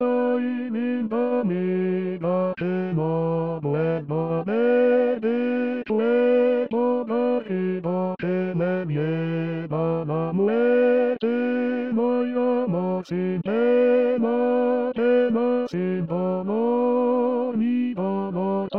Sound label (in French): Basse, choristes; Ay Linda amiga